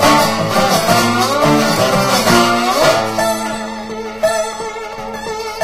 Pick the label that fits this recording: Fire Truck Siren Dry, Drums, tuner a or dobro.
dobro